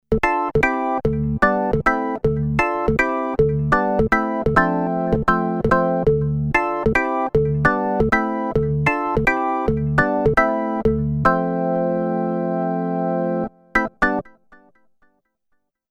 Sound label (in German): Orgel